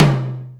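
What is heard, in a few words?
Hi Ring RM, Alesis D4 drum machine tom; 300 Drum Machines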